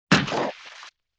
Gloop Hit; Vintage Cartoon; Hits & Tones

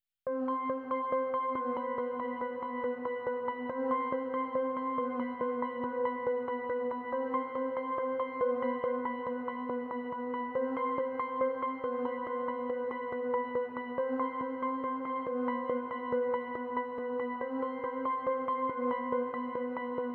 140 BPM A Min Arp, loop stems; Cymatics - 2022 Melody Collection - Wavy; Vapor

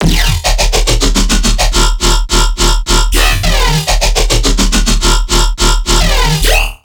Riddim Bass Loop D# 140; Bass Loops; Decepticon; Ghosthack; Riddim Essentials Volume 2

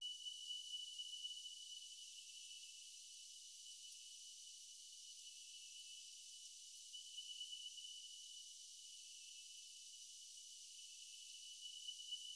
128 BPM C# Min Ambience, House; Loop Stems; Cymatics; Breakdown Loops; You And I

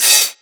Open Hi Hat, Dubstep Drum Shots; Ghosthack; Demolition; Infernal

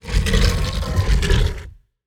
Stone Door Close; Game Essentials

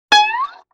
Violin Pluck Slide

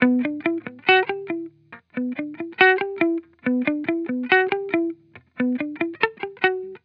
Hip Hop Guitar Loop - 140 BPM E Min; Cymatics; Vibrations Guitar Sample Pack

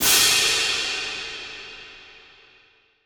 Cymbal - Am 87Bpm; Construction Kits; STEMS; Ghosthack; SkyRealm; Kit 18 - Shivering Sea

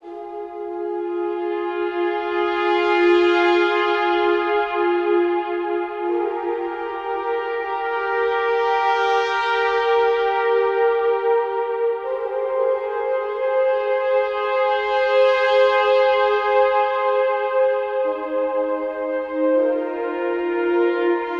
Brass - Fm 80Bpm; Construction Kits; STEMS; Ghosthack; SkyRealm; Kit 08 - Into The Light

